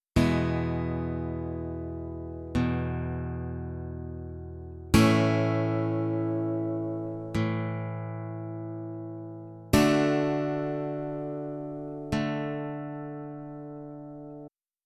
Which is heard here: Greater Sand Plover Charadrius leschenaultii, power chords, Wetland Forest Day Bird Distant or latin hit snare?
power chords